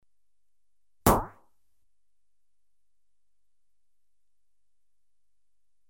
Pump action rifle (single shot), Electronically Generated Sounds; Sound Effects Library